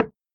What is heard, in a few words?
dice punch step; minetest